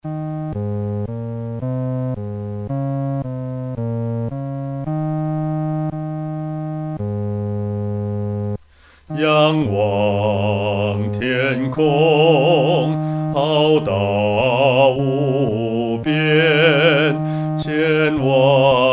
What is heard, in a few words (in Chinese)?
独唱 第四声; 创造奇功